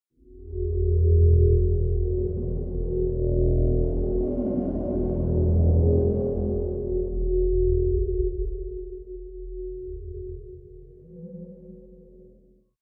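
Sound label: space unknown danger, science fiction; atmosphaeren umwelt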